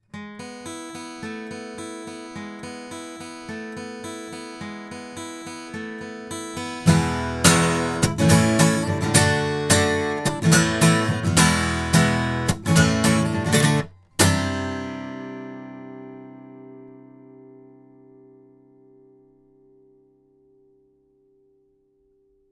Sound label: Oktava MK012 hypercardioid guitar